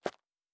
Dirt Run Surface, Footsteps; Survival Game Sounds - Lite Edition

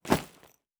Bag, Objects and Items; Survival Game Sounds - Lite Edition

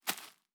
Leaf Walk Surface, Footsteps

